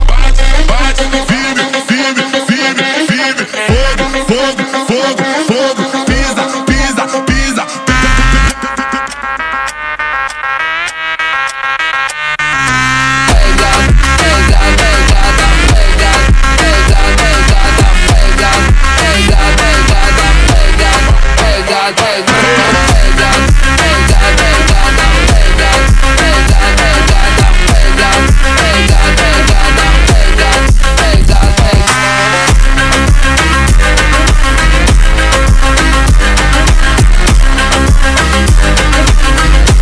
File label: montagem slowed